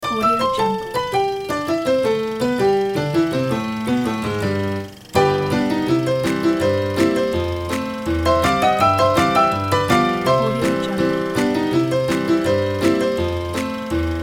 silent movie ragtime projector

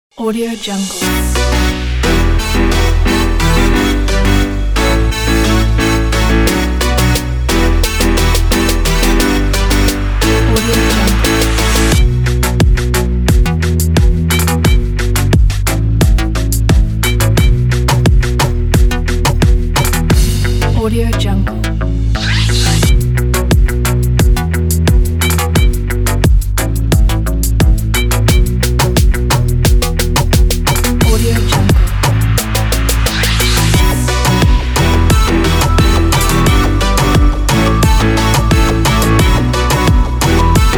latina dance

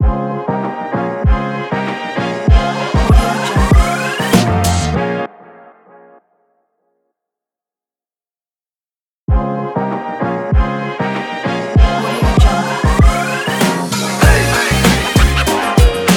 urban hip hop intro logo

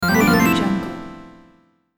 chiptune victory

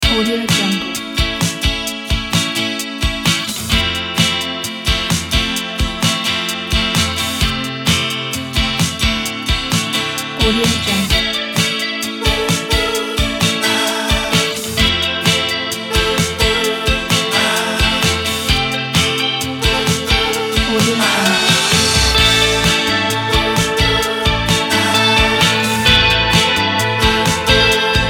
atmosphere indie rock

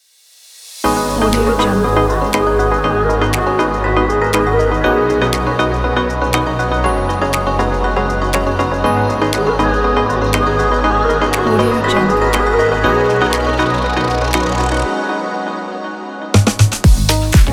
fashion upbeat summer house

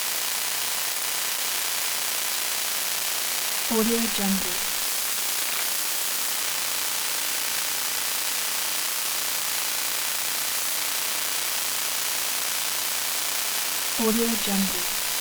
static crackle glitch; lokohighman

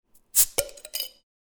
beer bottle opening

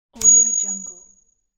coin in the air; kuksaudio

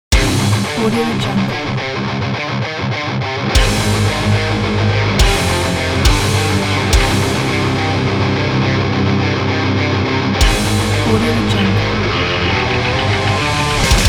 metal power

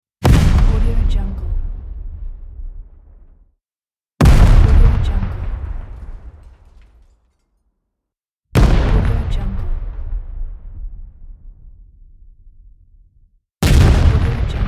explosions

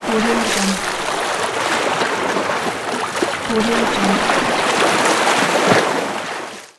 lake water

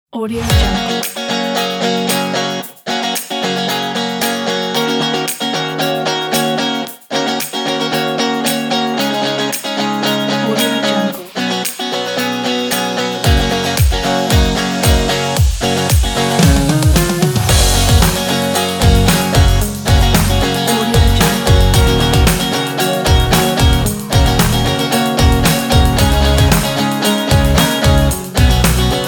guitar pop

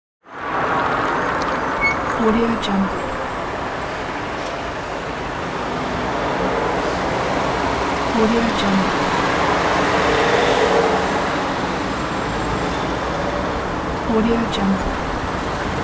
city car traffic ambience; holy records

